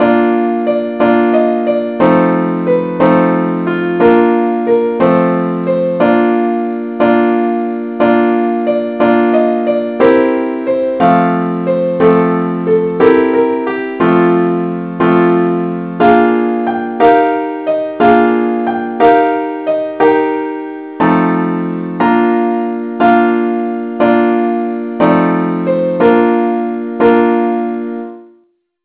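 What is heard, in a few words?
simple accompaniment, key b flat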